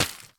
Footstep Crayon Paper

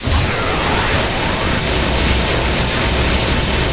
Laser; Burnin' Rubber 5 HD

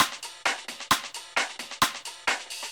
Triplet (Drums)

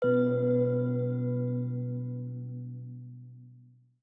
Secret Discovered

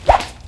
Swing; Peanuts: It's the Big Game, Charlie Brown!

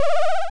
Character Heal

